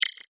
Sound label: multimedia rollover, Multimedia Rollover Tone; Tones; Sound Design; McKinney Sound